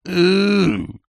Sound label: human male adult ssay eew as if disgusting; zapsplat